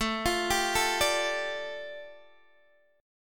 A 7th Suspended 4th Sharp 5th, A, down, Guitar, DADGAD